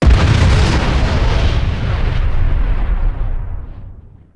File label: explosion big, heavy bomb; gbombs 5